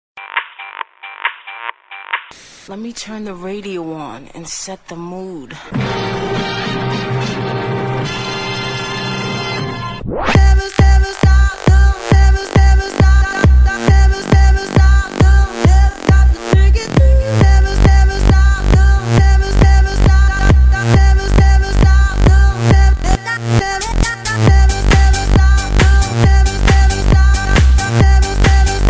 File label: Treble